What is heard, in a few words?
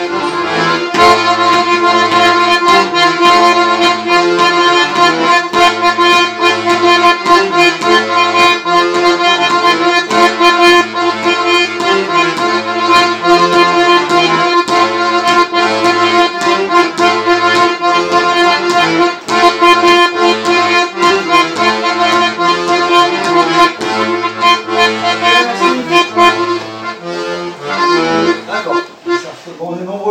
rythm accordeon; Colporteur